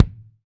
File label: BD, Roland; Sound & Vision - Gigapack I